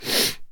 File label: Human Sniffing